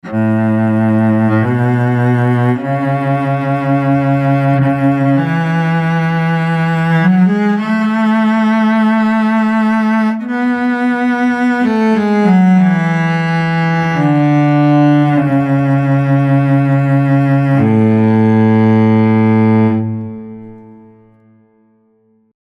Cello melodisch

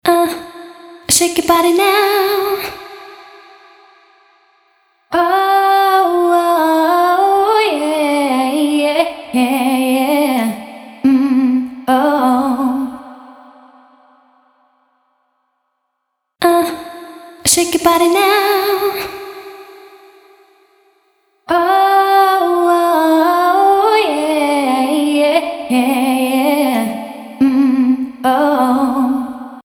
Voc Lead Room und Plate

